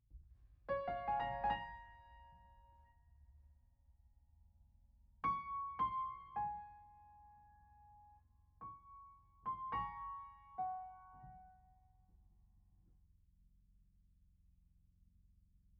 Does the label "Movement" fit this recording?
No